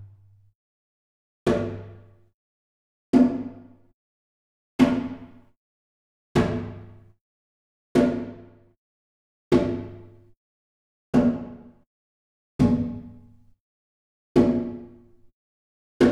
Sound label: Gamelan; Propeller Island - Complete Gamelan